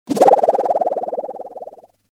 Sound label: move fluttered noisy fast short